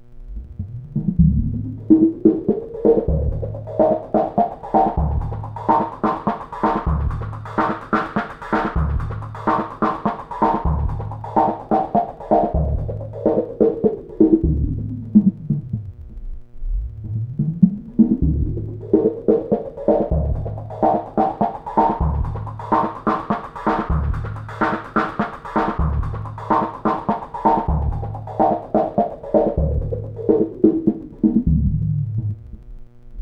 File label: drum hires